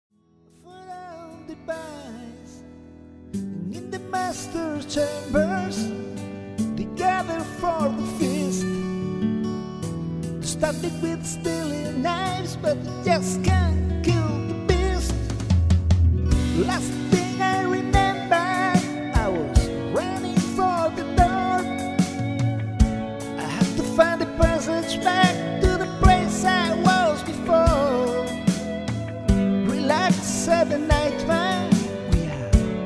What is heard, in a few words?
No Bass